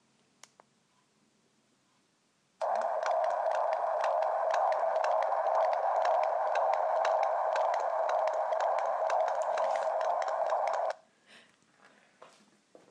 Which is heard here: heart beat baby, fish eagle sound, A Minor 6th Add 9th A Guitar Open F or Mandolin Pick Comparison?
heart beat baby